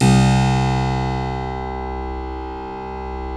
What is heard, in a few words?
C 2 CLAV; USB Soundscan vol.17